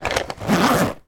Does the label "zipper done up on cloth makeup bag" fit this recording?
Yes